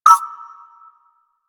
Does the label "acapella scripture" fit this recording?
No